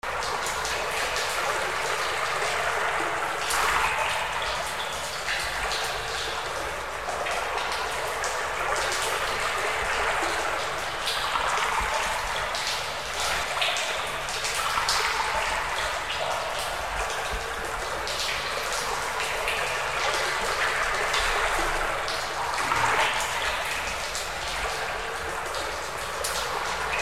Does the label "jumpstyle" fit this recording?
No